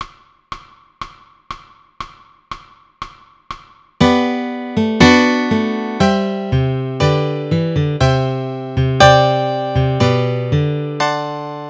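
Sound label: Guitar Melody Chord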